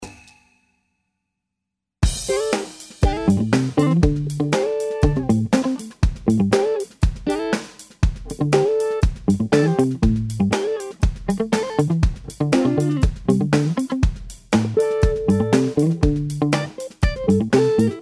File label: Gt Riff